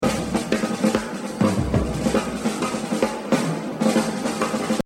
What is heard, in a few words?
Jazz Drumming; Exercise 24 Buddy Rich - 75%; The Evolution of Jazz Drumming (Gottlieb)